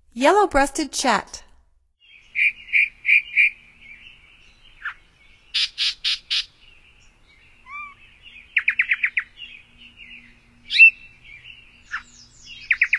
Yellow-breasted Chat, Songs of Birds of North America; Stokes